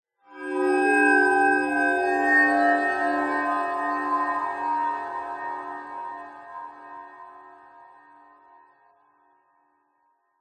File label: fantasy magic mysterious portal swell open with large reverb; zapsplat